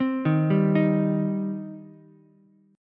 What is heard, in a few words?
C Minor C down Ukulele; arpeggiated; JGuitar